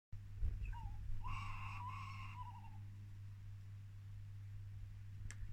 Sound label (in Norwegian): fugleskrik om natten